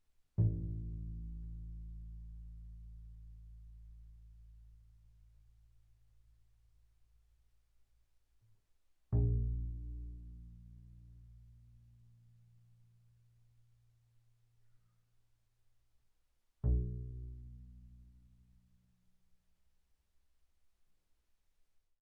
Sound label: Bass pizz sul A mf A1 B1, double bass